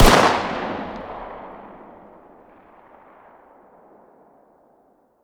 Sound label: fire dist 9x19 smg ext; gmod; arccw uc commons attachments